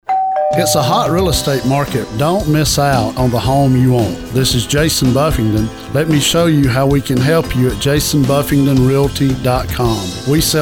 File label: door bell mix